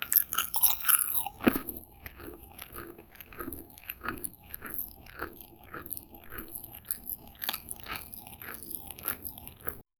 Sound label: realistic sound, active